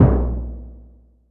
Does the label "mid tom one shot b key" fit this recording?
Yes